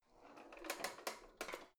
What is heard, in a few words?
old drawer creaky soft out